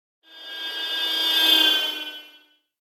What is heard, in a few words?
Car Horn Doppler By European - R to L; Hollywood Edge; sound designer's toolkit v2